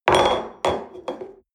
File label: espresso upset table hard, restaurant cafe